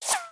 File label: bow hit; Minecraft; create-astral